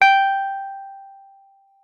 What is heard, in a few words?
WUFF G5, WURLITZER; Xsample VOL-1